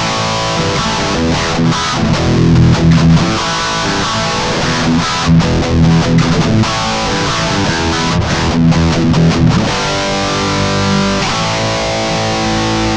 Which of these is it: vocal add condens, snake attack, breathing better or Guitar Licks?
Guitar Licks